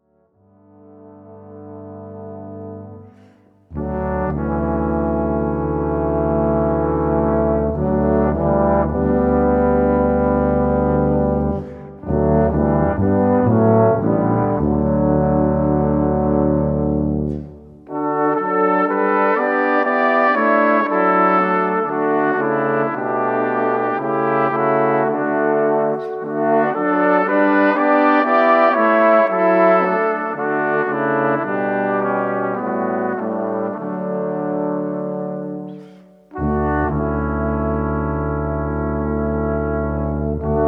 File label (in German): BRASSQUINTETT